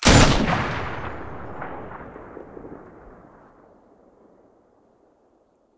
muzzle pkm stereo

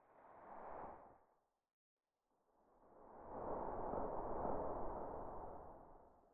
gauss shoot very far, weapons

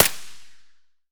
ak74 silencer distant, weapons; STALKER; IWP

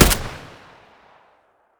ash12 silencer mono distant, weapons; STALKER; IWP

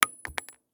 shell 39mm carpet; STALKER; IWP

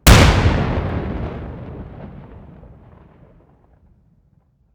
grenade vog25p boom; STALKER; IWP

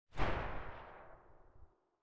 silencer dvl10 far

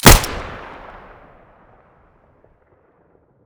muzzle fiveseven stereo, weapons

